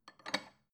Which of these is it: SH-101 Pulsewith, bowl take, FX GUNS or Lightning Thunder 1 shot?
bowl take